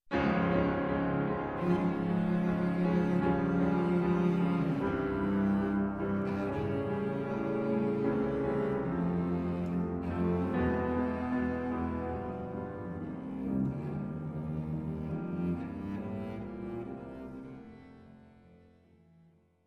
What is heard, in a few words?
Trio